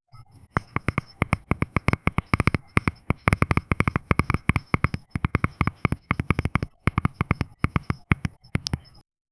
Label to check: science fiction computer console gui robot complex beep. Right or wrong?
Wrong